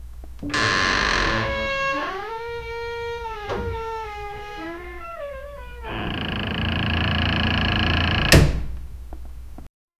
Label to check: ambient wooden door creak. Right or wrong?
Right